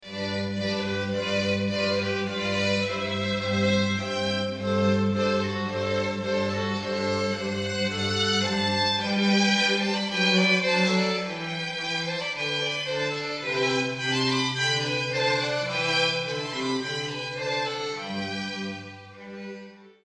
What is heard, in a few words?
strings; sheep safely graze